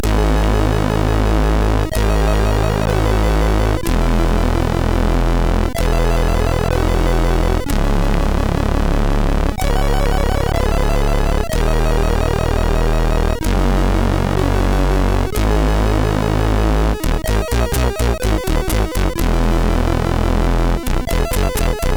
Magic Spell, MOS6581R3

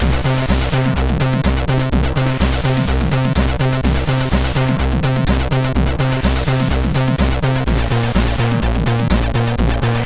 Newjack Swing, MOS6581R3

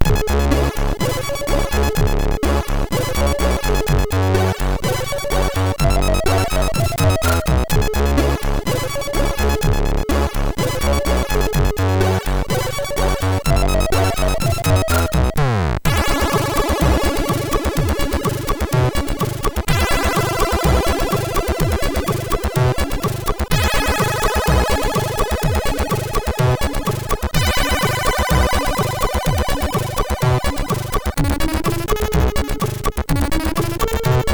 Plasmachaos, MOS6581R3

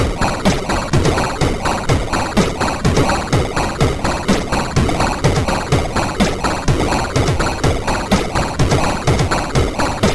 tune, sid, CSG8580R5

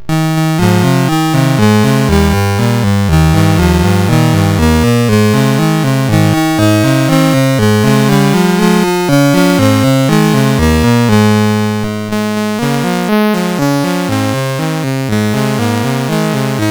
Happy Birthday, CSG8580R5

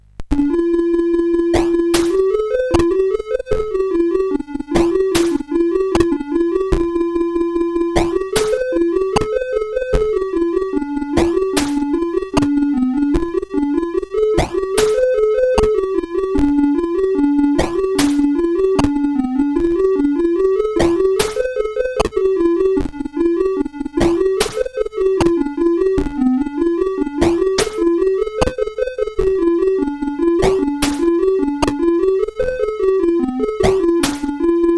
menus, sid CSG8580R5; Games Summer Edition

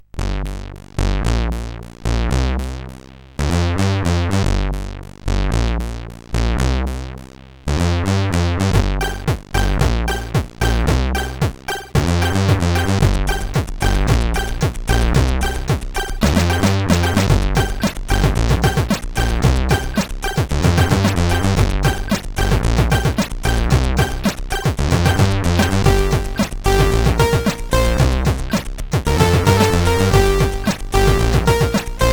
Spectrum tune CSG8580R5

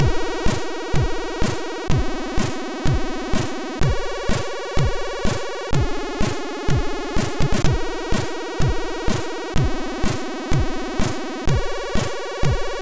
No Limits tune, MOS6581R3; sid

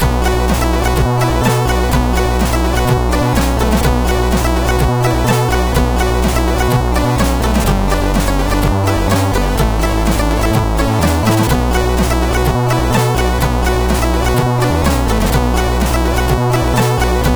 Intro Tune, sid CSG8580R5; Moon

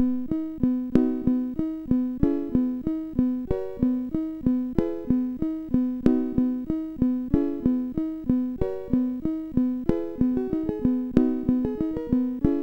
Piano Experiment, MOS6581R4; Madaco

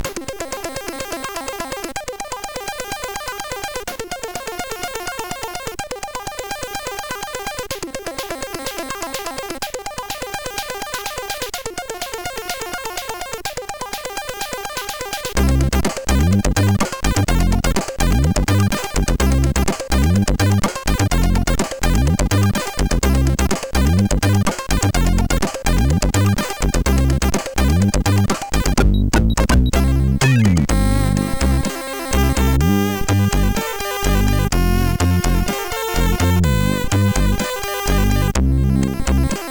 Simple Melody, MOS6581R4; hvsc

